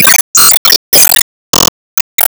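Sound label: Sound Effect Enemy Active Motion Clover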